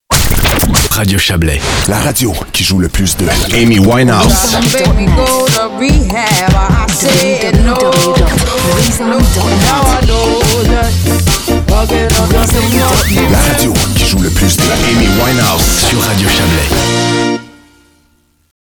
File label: Radio Montage Club; Radio Chablais